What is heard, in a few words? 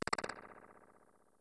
swooper; smw